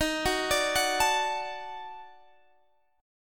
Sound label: D sharp Minor Major 7th Flat 5th, Guitar Standard; arpeggiated